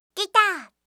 guitar

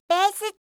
bass

